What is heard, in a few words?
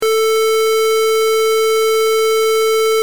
square 442